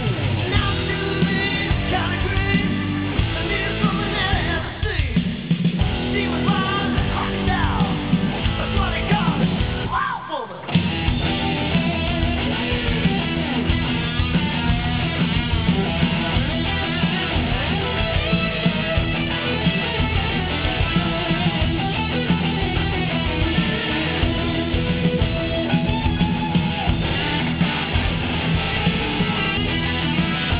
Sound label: swamp